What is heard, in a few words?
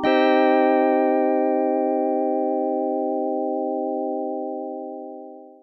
Square Synth Chord